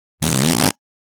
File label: Fart Foley - Surprise - Medium Healthy Rip; Hollywood Edge; sound designer's toolkit v2